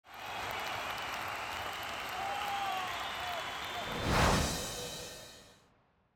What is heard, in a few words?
SFX; MetroPCS